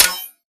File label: bullet light ballistic blades deflection